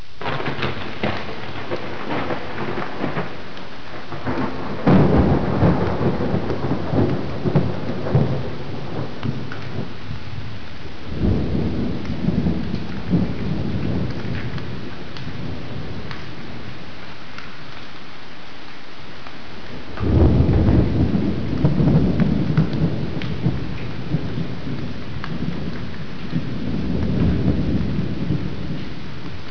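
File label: thunder rain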